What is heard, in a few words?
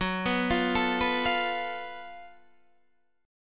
Gb Minor Major 7th Double Flat 5th, Guitar, DADGAD, chord